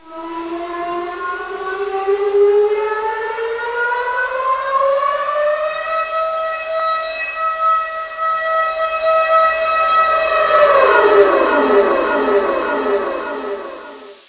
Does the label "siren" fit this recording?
Yes